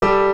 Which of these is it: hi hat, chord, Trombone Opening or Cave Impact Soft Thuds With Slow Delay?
chord